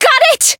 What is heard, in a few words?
Voice attack; mayu